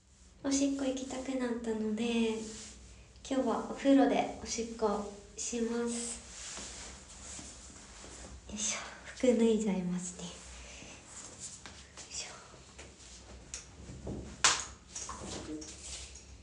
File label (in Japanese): お風呂で! しゃがんで! オシッコですッ!